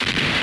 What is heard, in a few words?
Attack; RedDot